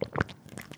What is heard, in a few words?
slurp SLRP